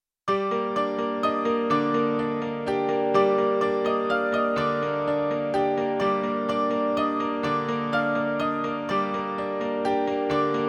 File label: Music Box (Piano Version), piano horror; Silent Hill 2; Fan Tracks & Remixes